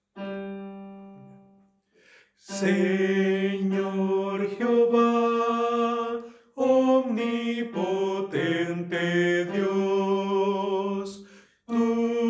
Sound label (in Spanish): Tenor, coro; Senor Jehova